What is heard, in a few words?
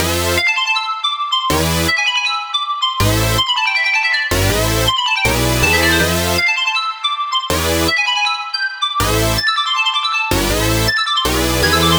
Pixie Drop Loop 160 A; embers